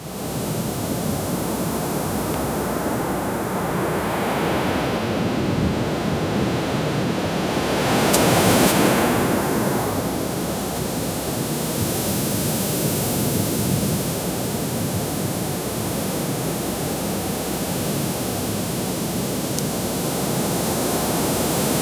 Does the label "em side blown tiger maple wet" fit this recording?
No